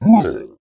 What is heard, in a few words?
monster; CC0 creature sfx 2